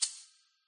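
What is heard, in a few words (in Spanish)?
botón spin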